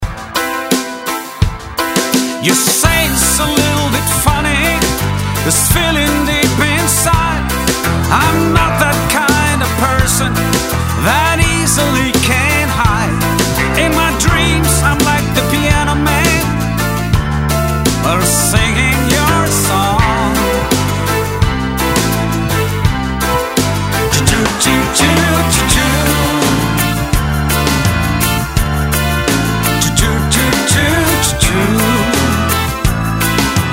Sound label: Sitting by my piano; musikk